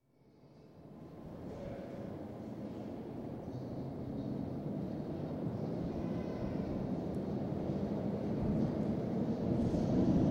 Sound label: Regents Park Underground Station, atmosphere on platform, train arrives & departs; BBC